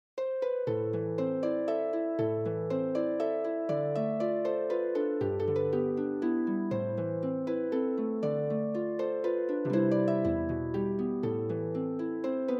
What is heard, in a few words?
Harp; Welcome